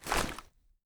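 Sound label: gear rattle weapon large; viper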